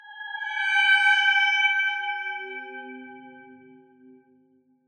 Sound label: ambient abstract metal evo; Half Life Model Pack